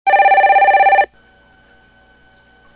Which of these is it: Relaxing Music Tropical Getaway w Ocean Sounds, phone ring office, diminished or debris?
phone ring office